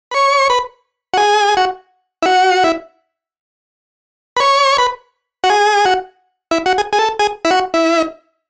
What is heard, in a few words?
lead